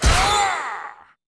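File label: ghost captain attack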